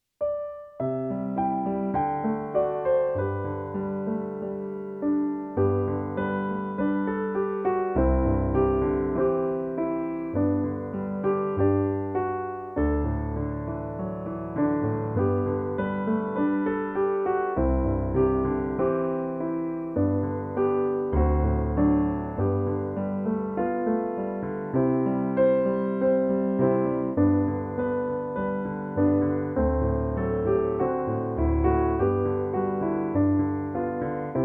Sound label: Zeichen weichen in C